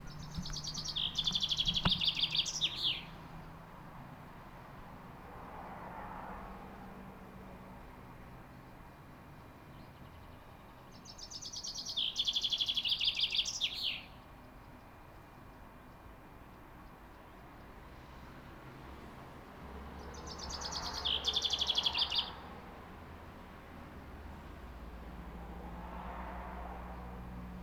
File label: birds and cars; fieldrecs